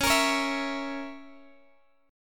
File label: C sharp Minor 6th, Guitar Open G